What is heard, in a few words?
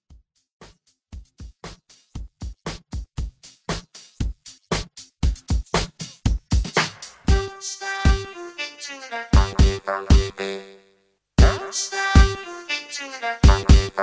Throwback Redrum